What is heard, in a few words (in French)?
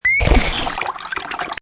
PLOUF; MOBICLIC 95